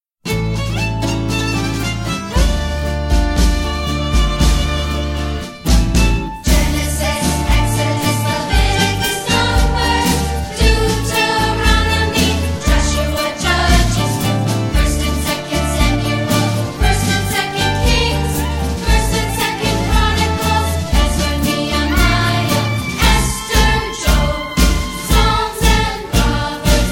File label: Vocal; Old Testament